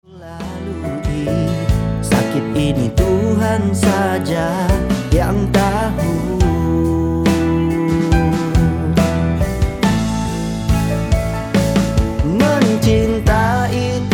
Pop Rock

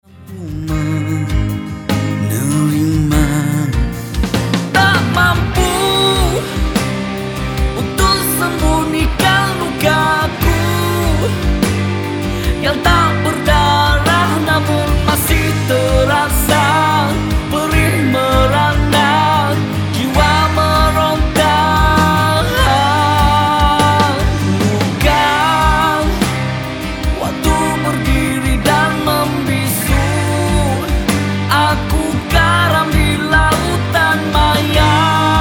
Pop Rock

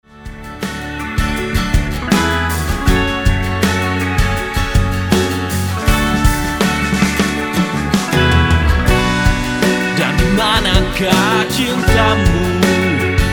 rock alternatif

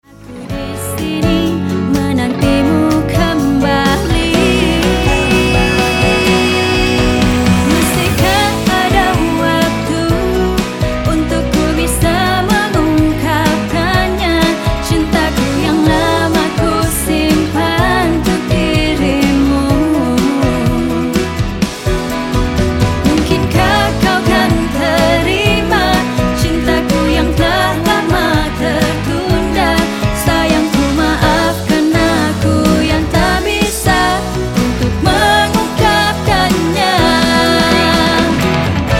Pop Rock

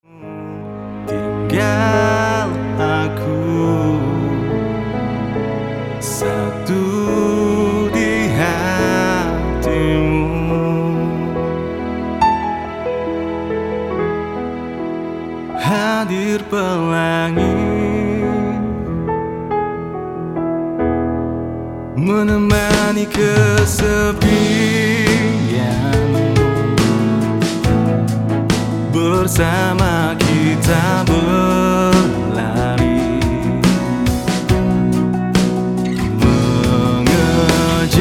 Pop Rock

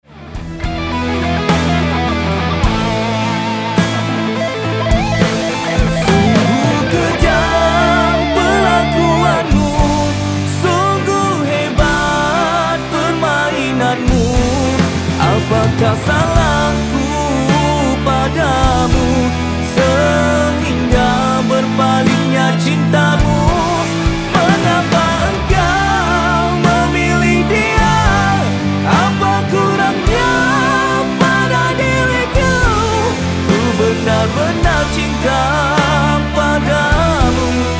Rock Balada

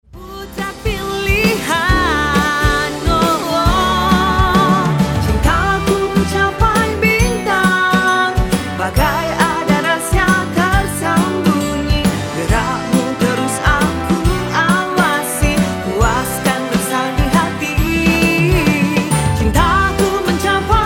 Pop Broadway

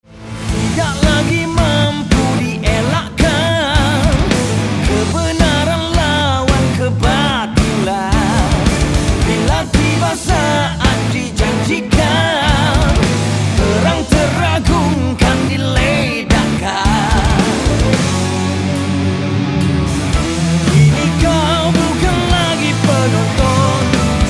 metal

